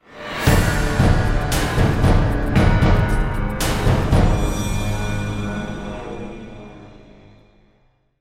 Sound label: end level; Alien Breed Trilogy